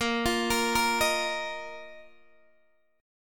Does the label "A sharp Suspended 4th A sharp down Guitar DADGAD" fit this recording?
Yes